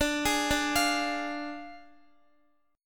D Diminished D, Guitar; Ddim arpeggiated; x,x,14,13,14,13

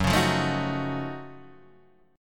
F sharp Minor Major 13th, Guitar, Standard; strummed

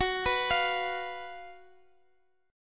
Gb Major 7th Suspended 4th, chord

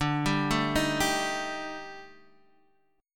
Eb Major 7th Flat 5th D, guitar

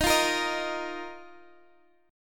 D sharp Suspended 2nd, Guitar, Drop D; chord shape

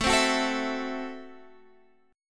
F sharp Minor 7th Flat 5th A

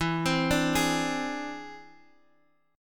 E Augmented 7th E down Guitar Half Step Down; E+7

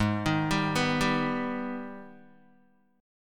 Ab Minor Major 7th, down, Guitar; arpeggiated; AbmM7

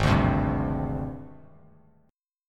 Ab Major 7th Flat 5th Ab, down, Bass Standard; AbM7b5 strummed; JGuitar

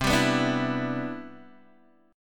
C Major 9th, Guitar DADGAD

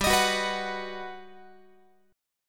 G Augmented Major 7th, Guitar; strummed

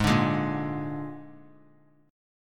Ab Suspended 2nd Flat 5th Ab, chord; strummed; JGuitar

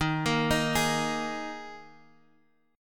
Dsharp Suspended 4th; arpeggiated; chord; 23,25,25,25; JGuitar

